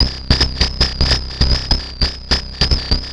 Atonaloop, Industrial Analog

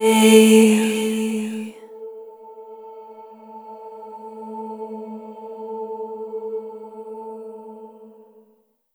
Chord A3 A4 Octave, Vocal Samples; Sonic Foundry (Sony Creative Software); Pocket Diva World Class Vocal Samples

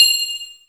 HOUCEY CHORD

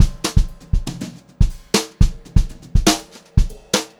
Brushed Drum Loops; USB Soundscan vol.39; AKAI; 90 s Sample CDs; 120 HOTROD

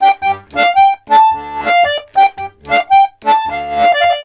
RONDO, Accordions; USB Soundscan vol.40; AKAI

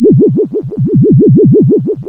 A#, FX; Big Fish Audio

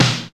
ROCK PERC.

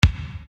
Bass Dr, EuroDance Bdrums

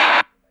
Snare Hi Speaker, Stylophone Beatbox

